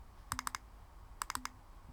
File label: machine; ダブルクリック音